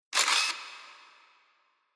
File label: Camera Film